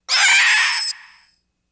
Zombie Cry Shriek; zombies

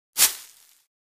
Foliage Impact Soft; hits & impacts

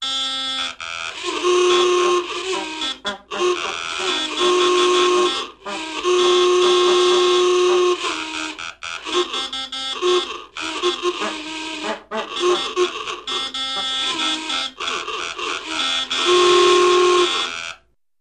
Various Old Car Horns ( I.e. 1920's Traffic Jam )

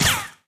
RICOCHETS

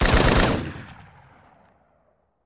Machine Gun Single Rapid Burst Fire

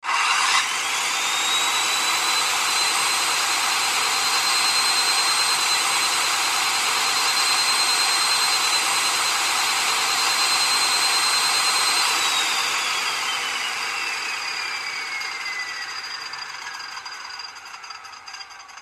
CIRCULAR SAW RUN; saws

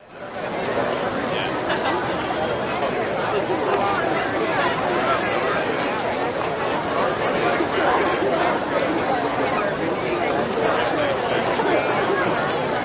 Crowd Loop Talking, medium

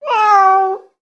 Cat Domestic Meow Sad

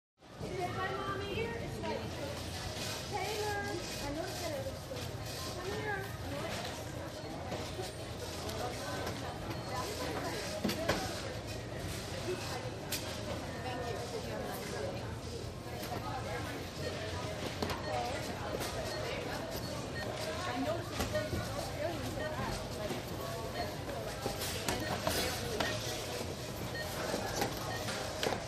Super Market Checkou, super market

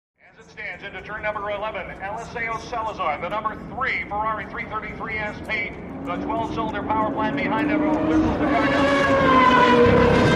Sports Car Race Amb

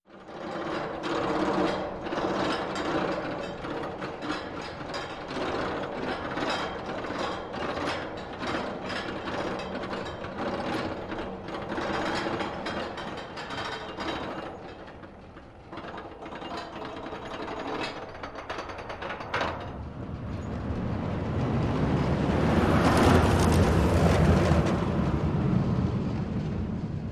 Roller Coaster Bys

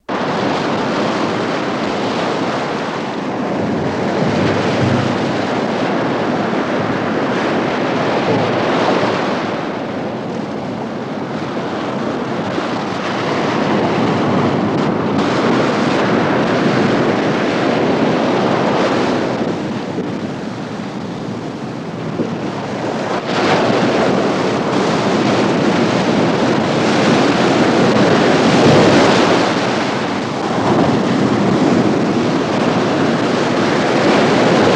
Wave Crashes Violent, surf

